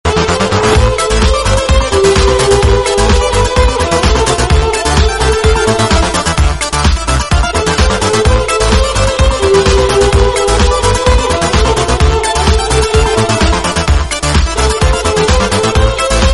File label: sarangi dance ringtone